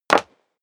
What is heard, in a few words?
step high heels wood parquet jump; schritte